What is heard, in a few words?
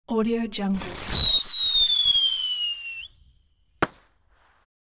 bottle rocket whistle burst; hollywoodedge